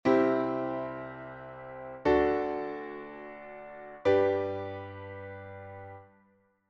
Do M - Re M - Sol M

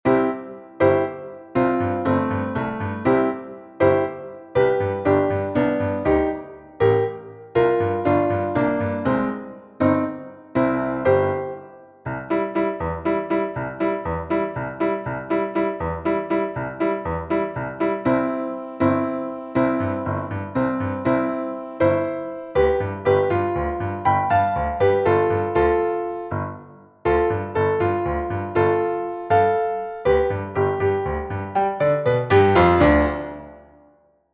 entoacion piano; Só acompañamento

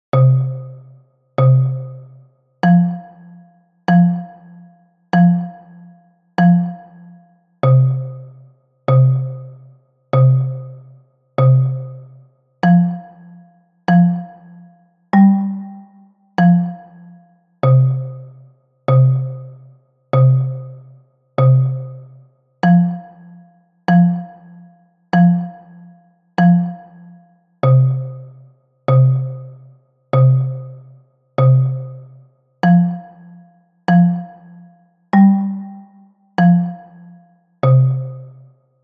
Xota Xilofono Baixo

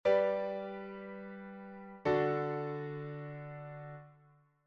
Sol M - Re M

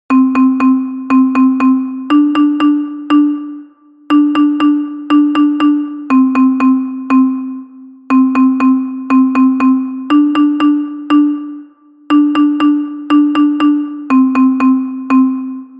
Xilofono Contralto

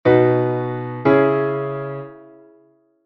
rota en MI M; Cadencia Rota

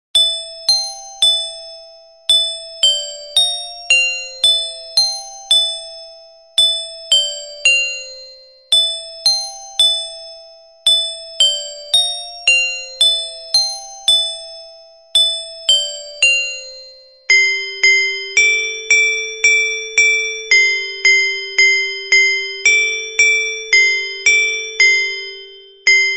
Muineira Metalofono Contralto; Muineira de Lugo

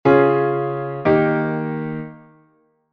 semicadencia

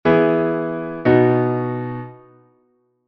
plagal en SI M; armadura 5 sostidos, dous acordes: mi-si-mi-sol; si-si-re-fa

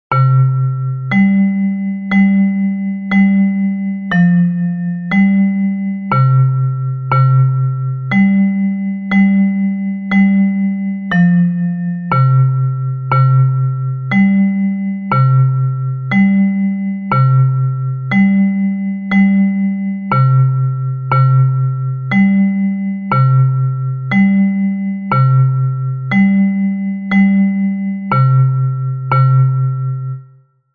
Metalofono Baixo

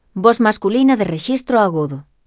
Tenor

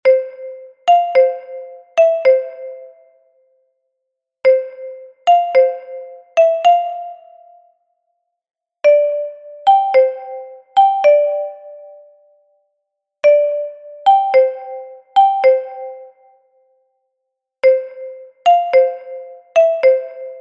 RUMBA Metalofono Soprano

